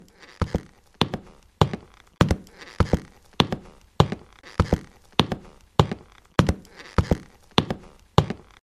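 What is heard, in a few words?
foot step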